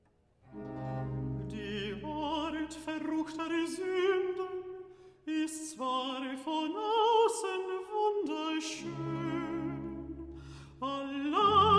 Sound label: Recitative; Bach; Cantatas I